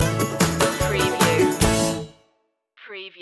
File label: just for funsies stinger